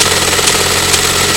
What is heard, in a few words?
chainsaw empty; weapons